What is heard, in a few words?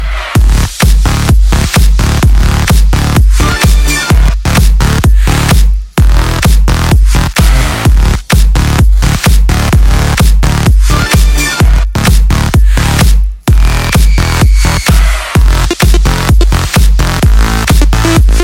Weird Funky Tune